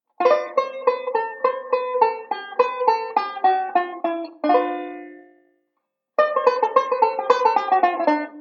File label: ii V Pattern, banjo